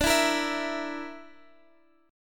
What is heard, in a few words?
D Suspended 2nd Sharp 5th D, guitar; strummed